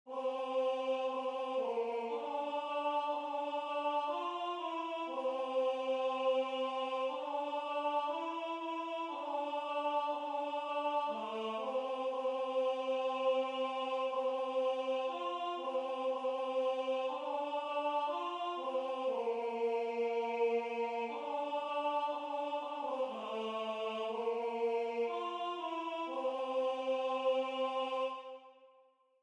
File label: Alto